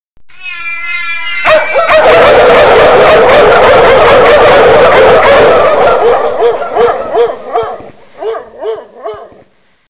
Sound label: dog and cat